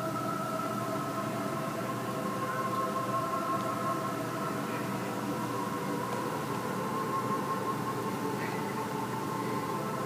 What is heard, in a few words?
Creepy Music Pyongyang